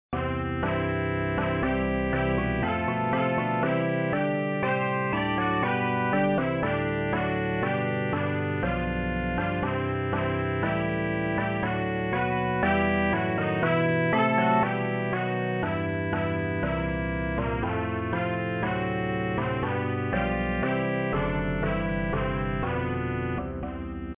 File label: Navy Hymn; memorialday